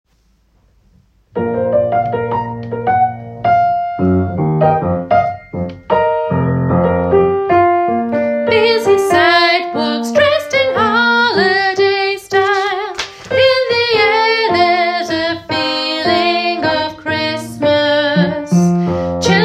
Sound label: alto